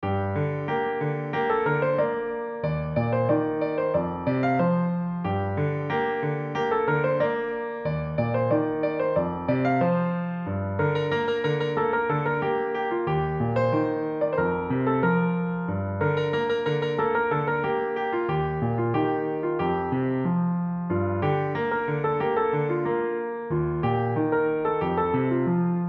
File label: solo, piano; Paiya